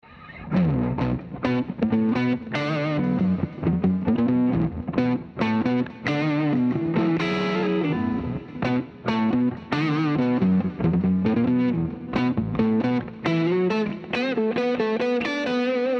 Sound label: octave, guitar